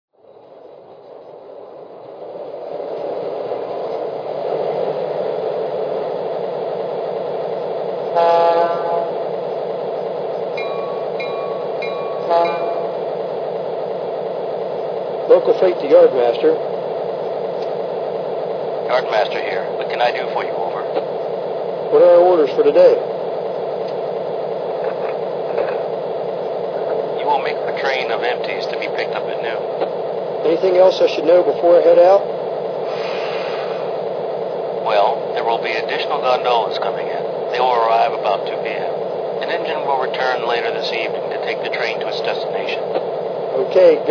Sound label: nw2